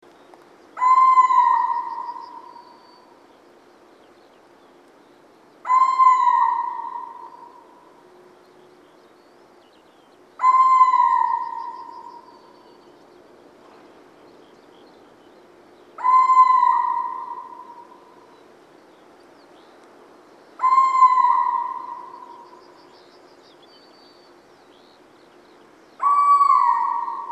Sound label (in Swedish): minervauggla